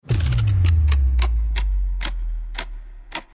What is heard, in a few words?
Bullet Time Start; OvertakingLegend